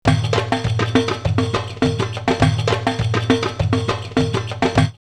Groove Ride 102, drumloops World Ethnic; X-Static Goldmine

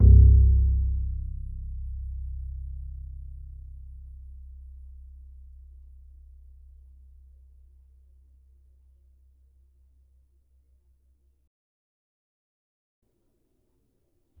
E, Upright Acoustic Bass

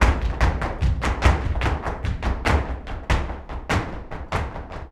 flamenco tapping, alegrias

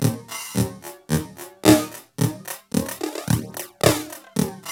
LOOP, TECHNO; TECHNO-BOX; ELEC-LOOPS

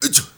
HEY HEY, MALE NOISE, Heart of Africa; Spectrasonics; Heart of Africa 1

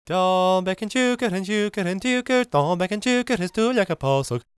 GAELIC, World Voices; ILIO; Vocal Planet

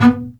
BASS MART B4; AKAI S-Series CD-ROM Sound Library VOL-2

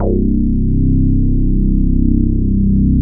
MKS BASS

